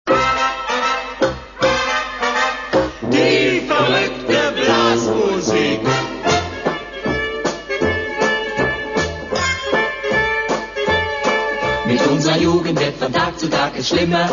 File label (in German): Die verrueckte Blasmusik